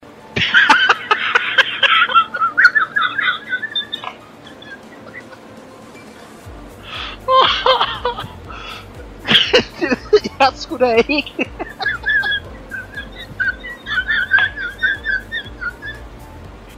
laughing cupcake

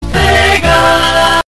sega sound; comparison in genesis sonic games

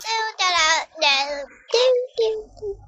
humming

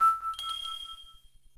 pictochat join

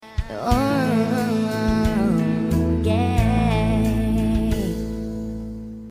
transition; hannah montana

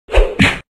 punch hard; adobe flash

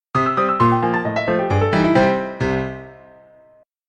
death on piano; super mario world

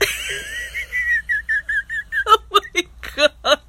kit laugh

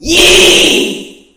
screaming; winston

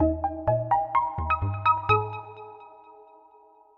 achievement jingle; emile van krieken

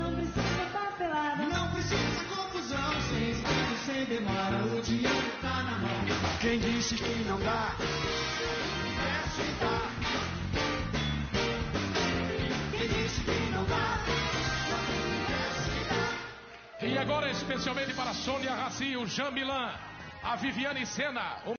jingle; faustao; fininvest

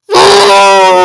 beat

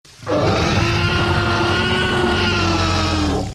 t rex roar; jurassic park

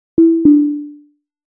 tesla autopilot off

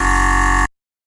wrong

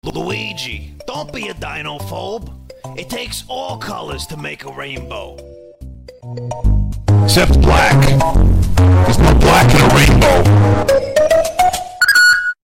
cry

